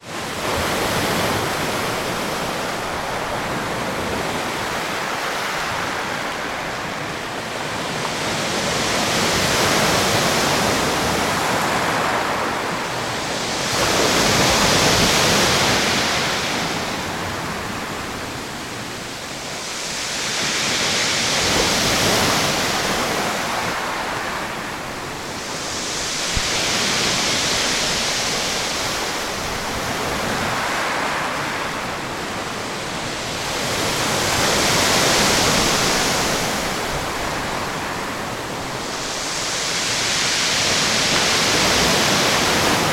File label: water ocean waves break on beach